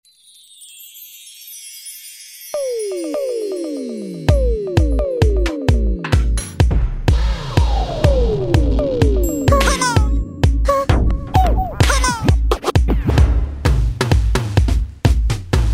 PhatTrak Kit, Roland SRX-05 Supreme Dance

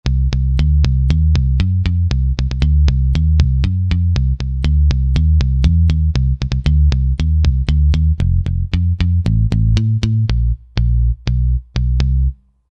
Bright Pick, Roland SR-JV80-10 Bass & Drums

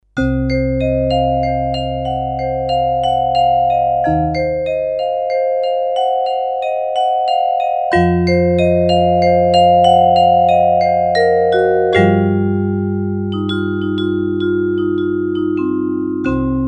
VIBRAPHONE, Roland MKS-20

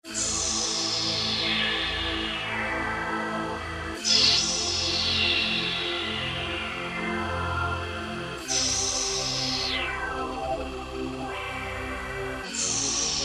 Vox Vex, E-mu Morpheus; Factory Presets

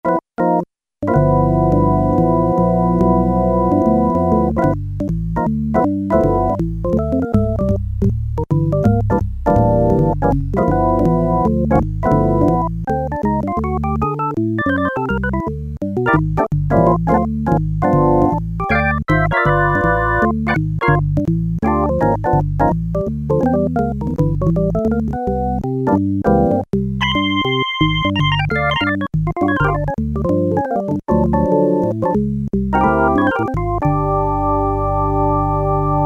Jazz Organ, Kawai K1m; Factory patches - I banks

